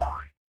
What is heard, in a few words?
cartoon boing